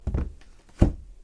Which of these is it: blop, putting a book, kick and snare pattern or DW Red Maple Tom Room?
putting a book